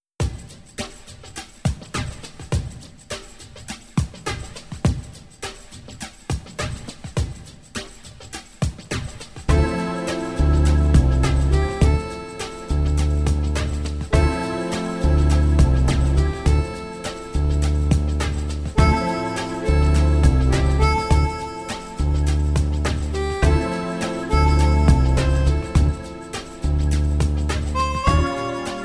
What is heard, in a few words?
Urban Lounge